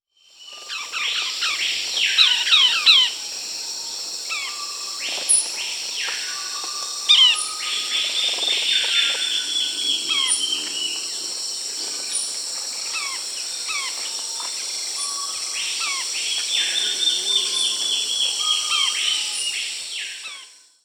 Dusky-chested Flycatcher (Myiozetetes luteiventris), Peru; S. America